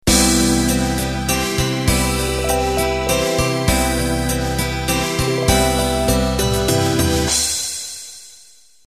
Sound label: Waltz Intro